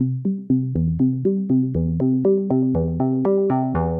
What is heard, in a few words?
SH101 Arp (120BPM), retro house